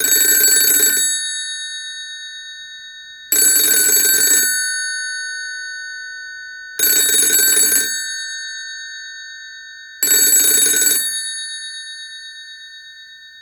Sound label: rotary phone ringing